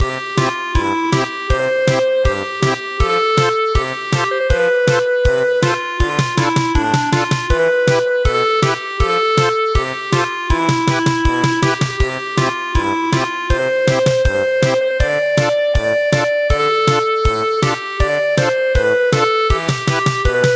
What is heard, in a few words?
German Polka; traditional